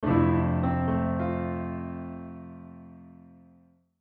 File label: Piano Fill Accent; Musical; Blastwave